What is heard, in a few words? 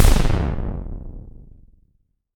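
EXPLOSION, ANALOG SYNTH; 1000fx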